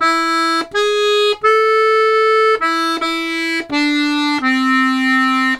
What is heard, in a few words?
Complete Accordions; USB Soundscan vol.40; AKAI